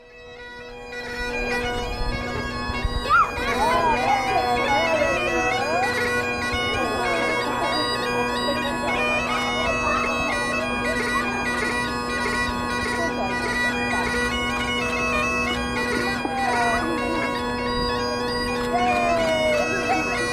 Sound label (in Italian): cornamusa